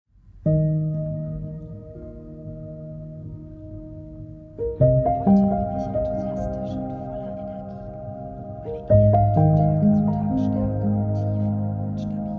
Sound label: Soft Piano